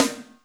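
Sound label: Snare Hyper Piccolo, Alesis D4 drum machine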